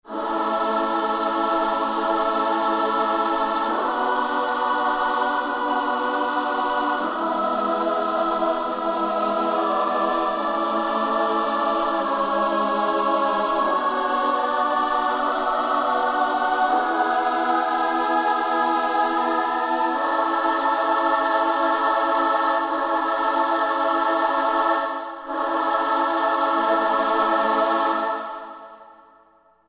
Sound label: St. Chld Choir; Roland SRX-03 Studio SRX